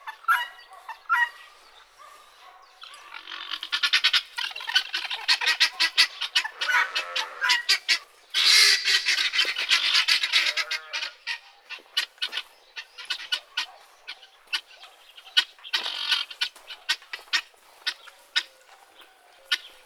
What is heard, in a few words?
Guinea fowl; افکت و طبیعت و حیوانات